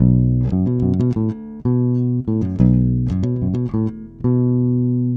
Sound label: 092 Old School Bass B, E-Bass; Pop Rock; Pro Tools Essential Loops; Digidesign